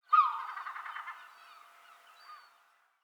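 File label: ambience sea birds; War Thunder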